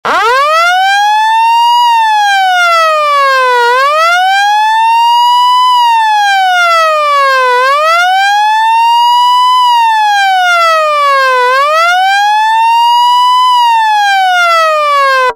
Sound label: siren; twb300s